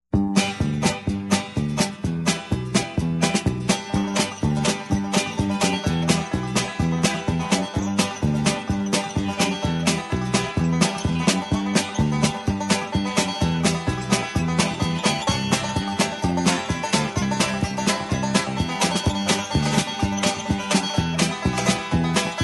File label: 4 wheel banjo extended; square dance music